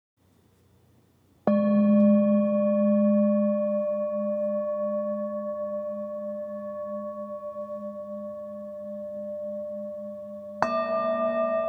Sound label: bells pad